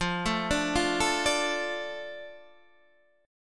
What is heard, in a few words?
A Suspended 4th Sharp 5th